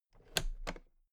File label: toolbox latches close soft double; industrie wirtschaft; handwerk